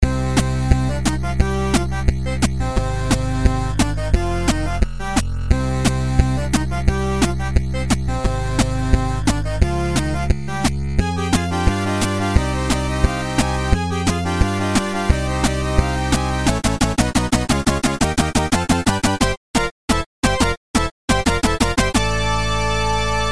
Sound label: 8-Bit; Sailor Jupiter